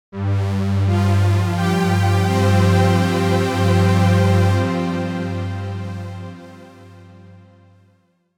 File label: Long String; Cherry Audio GX-80